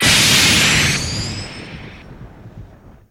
Underwater Laser Bl, Underwater Laser FX, Sharp &

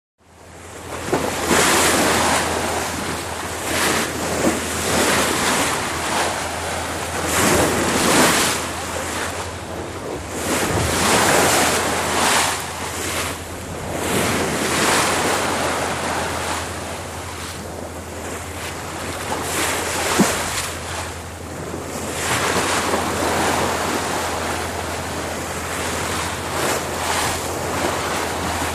Bow Wash Ships Eng Hum

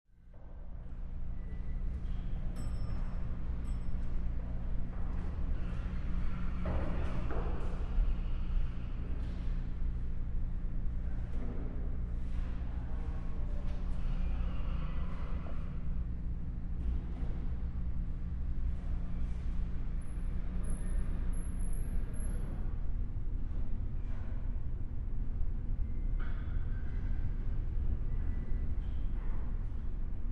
Large Airy Lobby Area

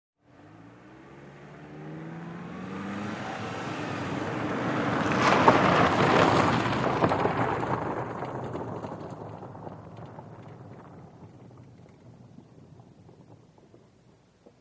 Sedan Pavmt Grvl Med

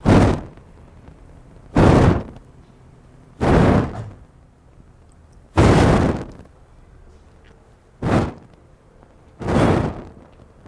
Blowing On Torch; torches

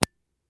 Buttons

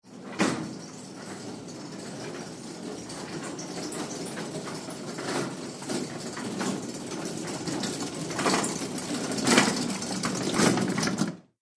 Gurney Cart App Slow, gurneys

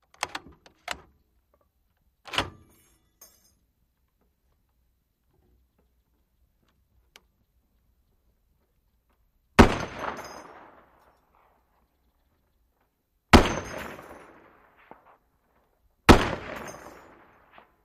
50 Cal Chamber Shell S

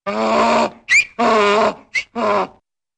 Donkey Bray Whistle

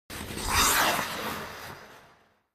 Ice Skating Fast Sto

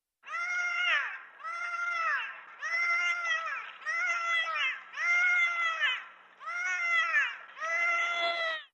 Dolphins Crying

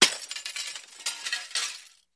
Glass Break Brittle; shards

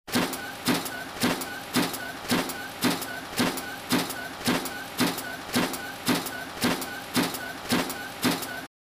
Big Lumbering Shut Do, servos

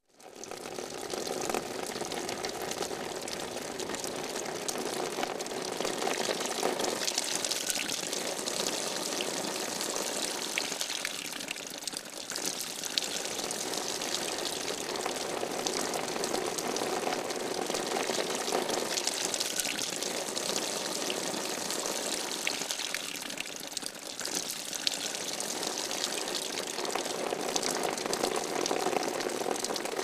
Rain Heavy Pouring, raindrops, splatty

